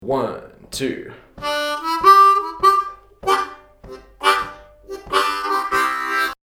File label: Lick, harmonica